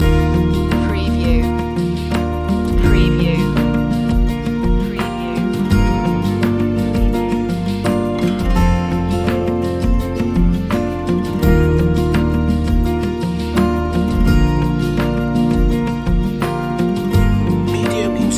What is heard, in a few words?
acoustic escape loop